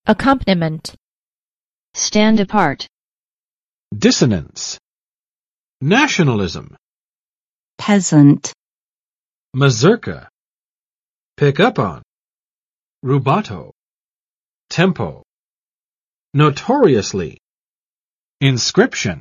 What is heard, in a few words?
accompaniment; frederic chopin pride of poland